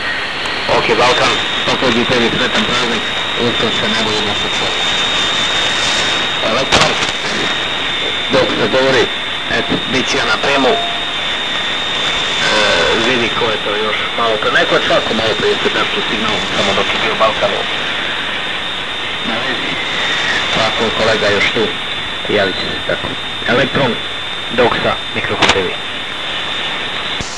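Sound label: 1709 khz, pirate radio; Doxa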